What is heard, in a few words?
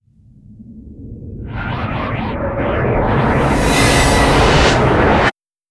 futuristic cinematic noise whip